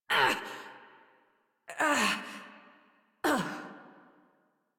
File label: Struggle Long; New War; Adult Operator